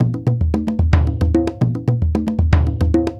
African Drum 75 bpm; Quazibeat; African Secret; African Drums

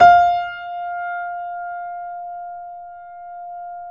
F 4, Keyboards Acoustic, GRANDP ST; USB Soundscan vol.17

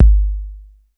808 LITE K1; Drums In Your FACE!

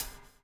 BUBL; Spectrasonics - Backbeat

